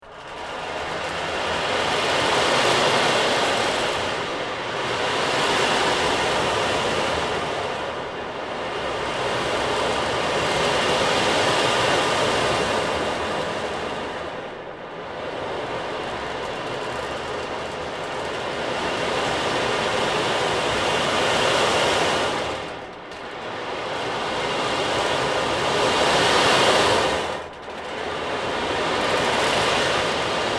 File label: Perc Rain Drum; Blue Dragonfly